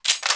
ump45 boltslap

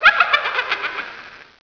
zombie banshee laugh

